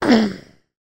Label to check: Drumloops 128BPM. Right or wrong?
Wrong